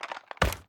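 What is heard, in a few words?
sniper reload empty end; gmod; iw8; xmike109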